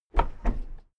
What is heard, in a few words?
car lift down short